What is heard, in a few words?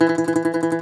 DOMRA, Segundo Domra, Plucked Strings